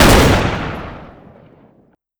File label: Drumshot Fire, weapons; tf2classic